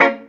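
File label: guitar chord A# minor, roots reggae; Sounds Good - Roots Reggae; GTR CHD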